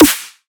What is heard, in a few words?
Heavy Snare, Dubstep; Drums; One Shots; Snares; Cymatics; Dubstep Starter Pack